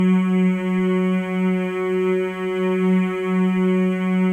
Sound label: male; Spectrasonics; Symphony of Voices